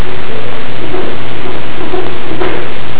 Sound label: crying noise